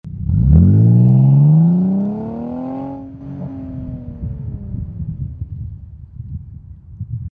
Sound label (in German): 6 Zyl Losfahrt